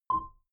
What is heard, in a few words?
button keypad tonal dirty; signale